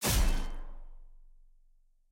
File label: aah; viego